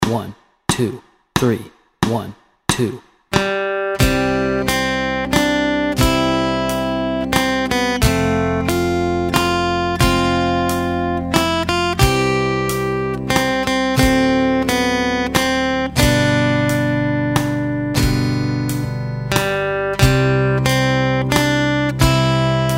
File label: Home On The Range Melody and Chords Track, guitar